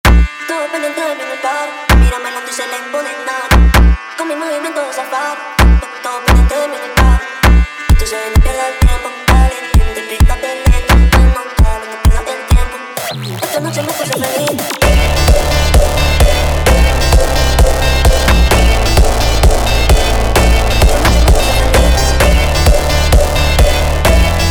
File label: hardstyle Remix; آهنگ فانک